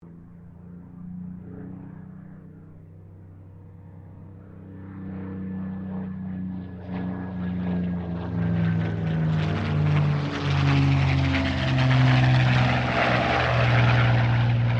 AIRCRAFT SUPERMARINE SPITFIRE MK-XVI PASS; Airplanes

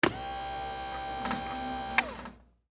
Servo Motor, Hospital, Bed, Up, Feet; Motors; BlastWave

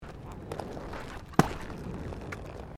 ROLLERBLADING BITUMEN SMOOTH JUMP; Sports

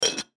Clay Pot Set Down, Foley Clay Pot Set Down; Pots And Pans; Restaurants; Blastwave

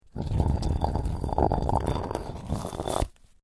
Rock Grind Scrape Slide Rattle; Medium weight rock scrape against uneven rocky surface. Deep rock slide sound; Rock Sound Effects

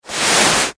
Snowboard Skid, Snowboard Skid Brake Stop Board; Skiing and Snowboarding; Blastwave

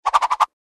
Martial Arts Ninja Star Throw Whip Spin Twirl; Warfare

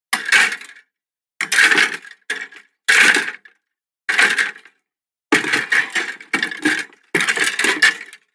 Wardrobe Noise

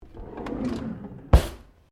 Drawer Large Kitchen Close; Various domestic drawers; Household

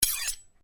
Blade Swish, Sword

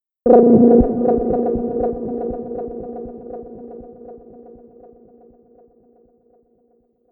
Mystery Magic, magic sound effect; Role Playing Game; Andrew Fleming

